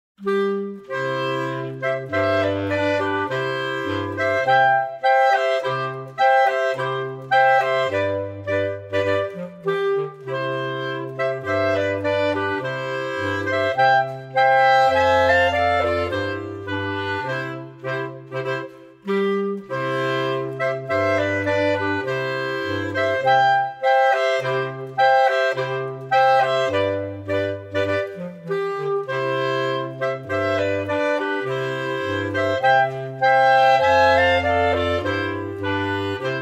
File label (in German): Marsch